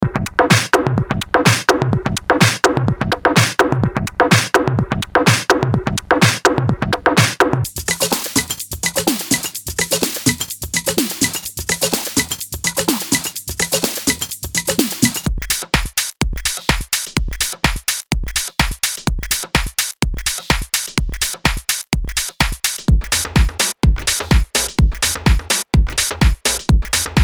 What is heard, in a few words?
Garage Drums; NU Garage